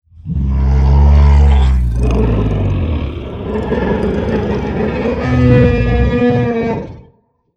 t-rex growl

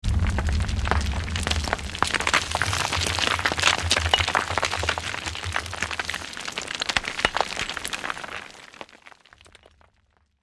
impact rockslide

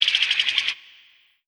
rapt click short

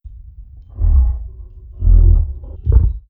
trex breath

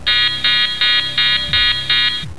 alert; MECH2

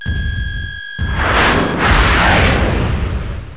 lock n fire; MECH2

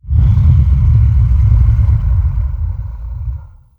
trex breath big

